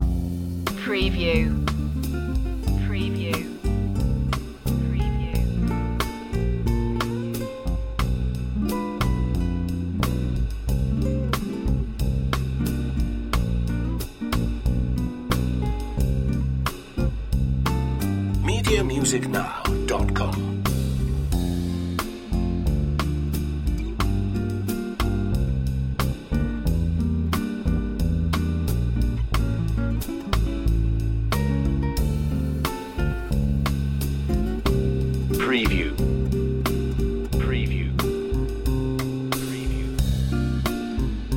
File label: bossa nova jazz trio